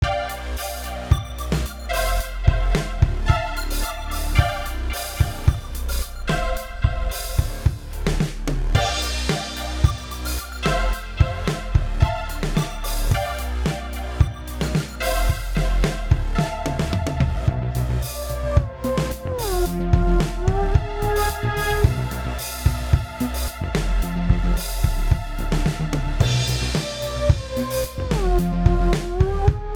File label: Instrumental soundtrack